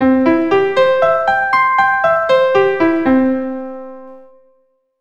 C Major Arp RH, keyboard

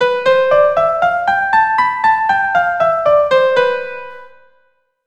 C Major Locrian, keyboard

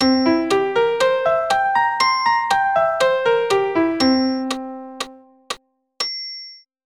C7 arp, keyboard

